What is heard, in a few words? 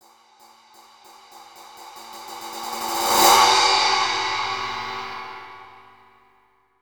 ride